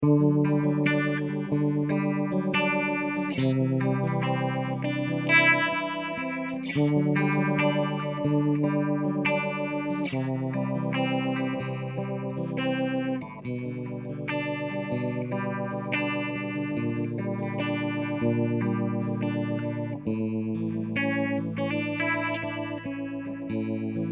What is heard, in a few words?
Full Speed clean